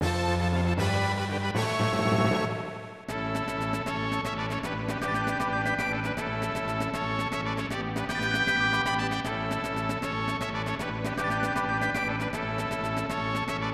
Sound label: victory